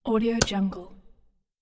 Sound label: click; legatopromusic